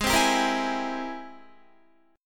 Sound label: Ab Augmented Major 9th Ab Guitar; strummed; Ab+M9; JGuitar